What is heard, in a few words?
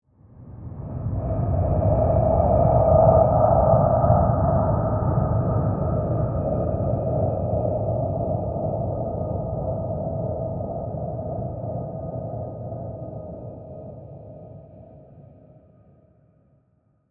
Ominous Presence, Horror, Ominous, Presence, Dark, Demonic; Scary Soundscapes; BlastWave